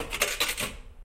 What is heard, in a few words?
coin drop; SCP 294